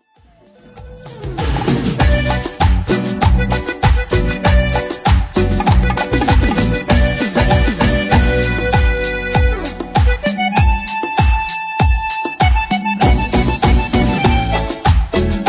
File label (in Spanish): Cumbia